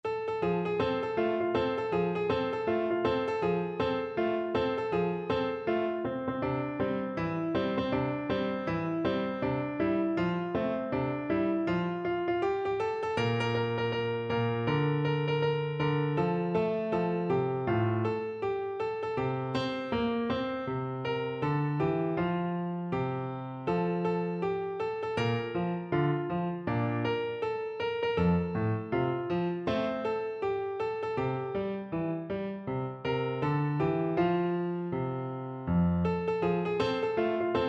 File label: never get to heaven, piano